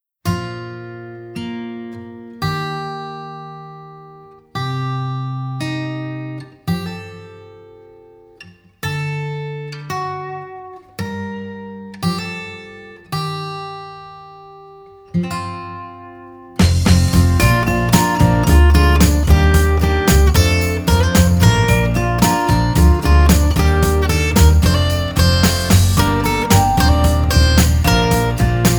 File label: Instrumental